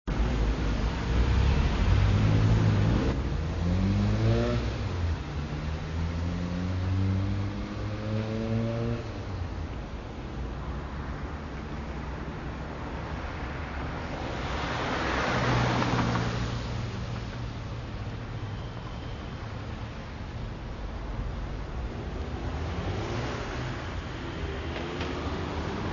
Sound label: ambiance city